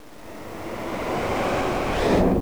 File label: vrooom